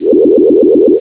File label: ringer